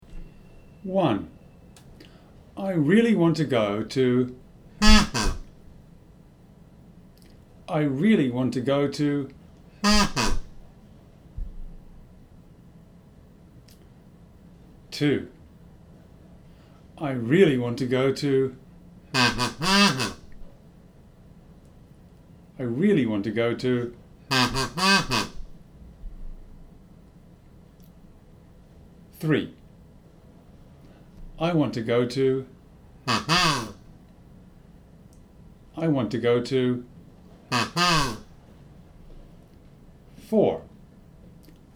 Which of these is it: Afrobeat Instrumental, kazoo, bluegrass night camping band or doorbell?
kazoo